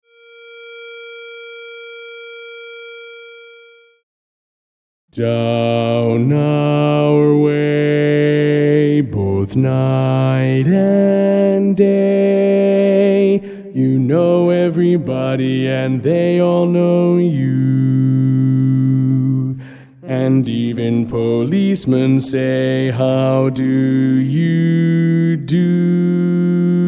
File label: Bass Predominant